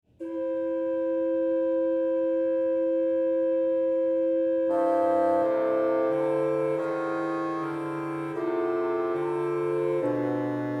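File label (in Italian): corali, organist; 6) Wer nur den lieben Gott läßt walten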